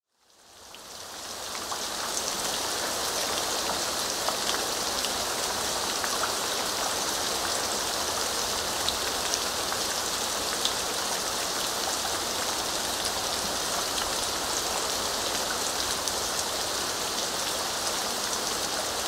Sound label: Rain Sounds